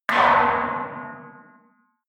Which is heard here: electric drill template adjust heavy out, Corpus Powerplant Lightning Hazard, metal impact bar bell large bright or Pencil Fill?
metal impact bar bell large bright